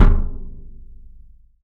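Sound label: RESONATING KICK; 3D Drums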